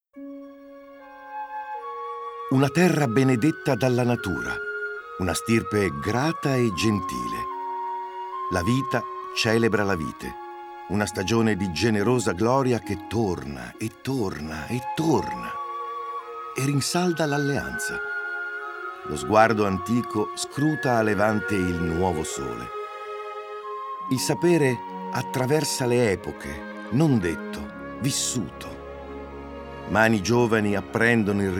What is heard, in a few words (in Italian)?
lirico